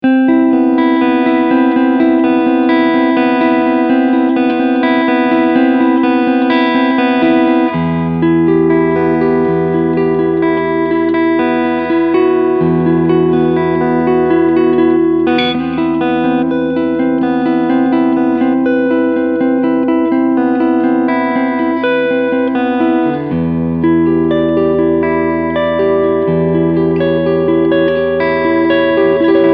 Clean Front PU Singlecoil

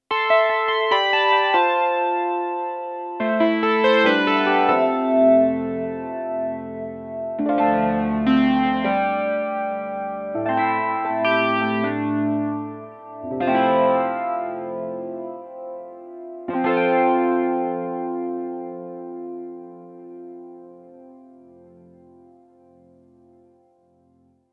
Sh-4d Model Wavetable Wurly

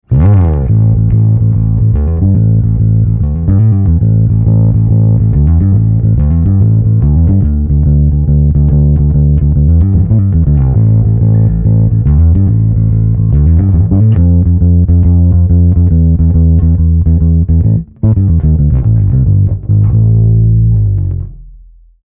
EBS Classic 500 Smooth Tube a like drive

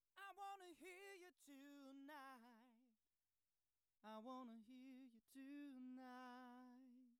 Behringer ADA8000 40dB

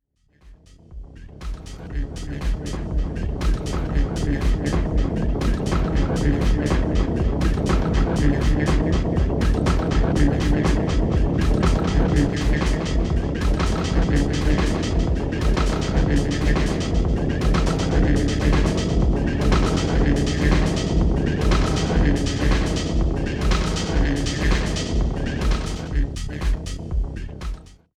Tape Echo; Xone PX5

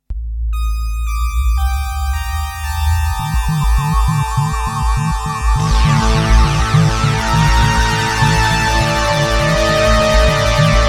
Polysix UFOs landed; Polysix DAW

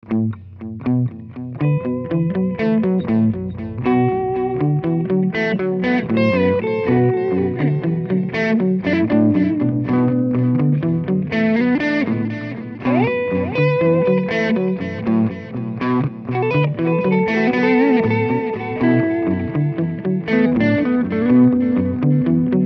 Kemper Profiler Stage

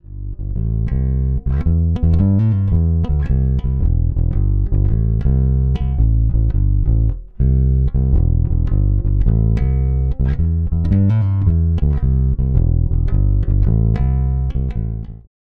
Neck Pickup; Lakeland 55 94 USA Deluxe

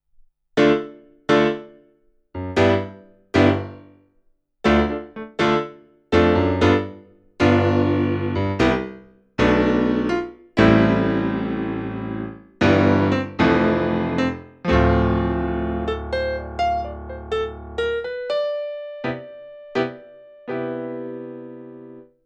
CLP575 Modern Piano

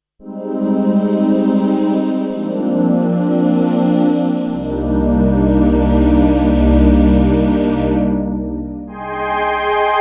Korg i3 Synth

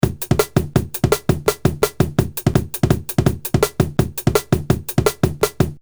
Loop Dry

